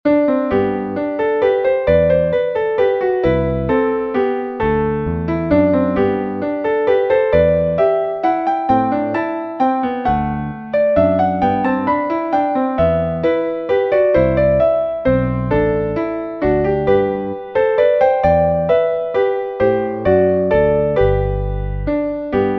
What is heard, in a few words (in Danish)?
Vals